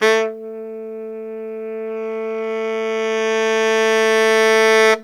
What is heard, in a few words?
CRES. BARIT, Pop & Funk Brass; Best Service ProSamples vol.25; AKAI